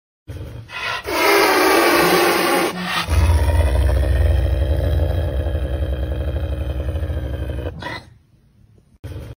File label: Wild cat sounds